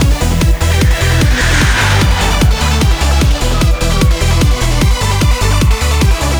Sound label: EUROBEAT